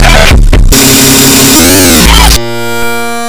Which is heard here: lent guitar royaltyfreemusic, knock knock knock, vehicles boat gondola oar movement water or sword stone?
knock knock knock